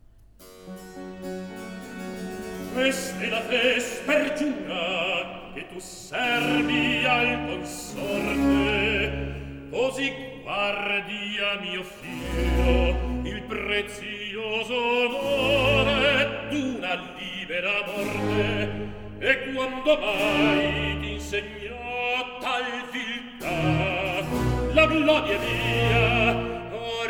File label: REC. Accompagnato; Atto II - Scena VII; Quest'e la fe spergiura